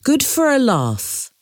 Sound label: good for a laugh